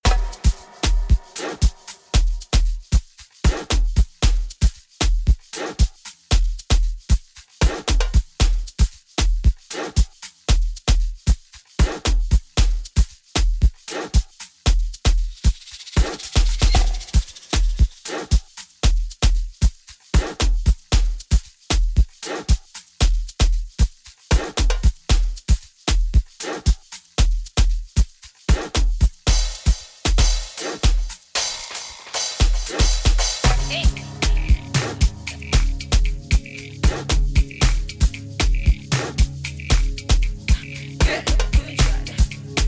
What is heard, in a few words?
Amapiano Remix